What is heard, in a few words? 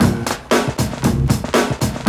KLAPPER 116, loop; 115-121 BPM